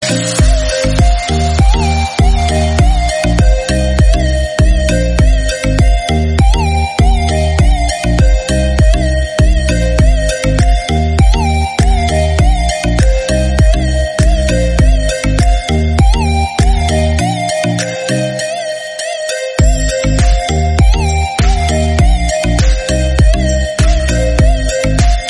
mind blowing chinese music tone ringtone

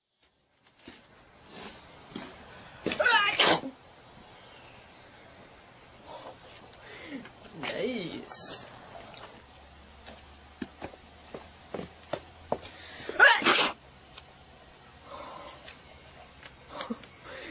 sneeze money ringtone

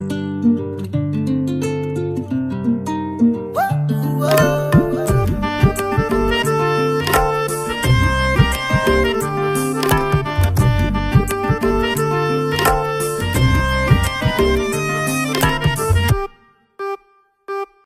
music ringtone; bollywood ringtones

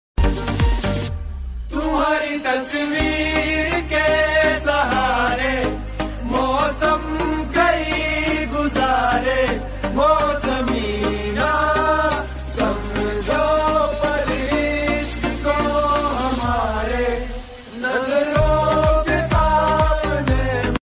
dance music remix tone ringtone, bollywood

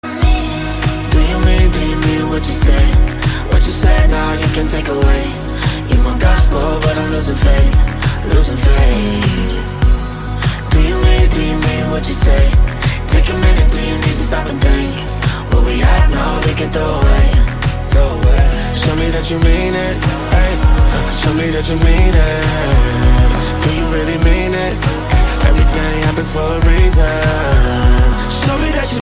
cool hollywood ringtone

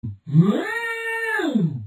alert ringtone; cheat commando

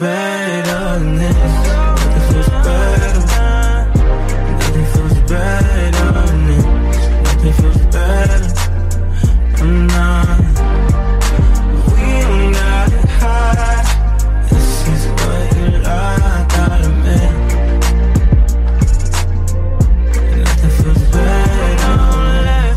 vibes music ringtone; english ringtones

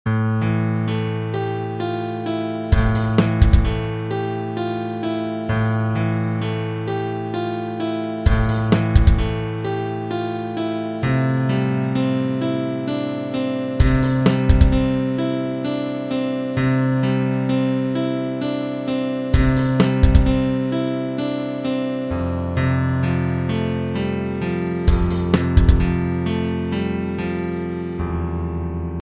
blues

